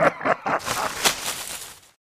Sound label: wolf Hide; fairyred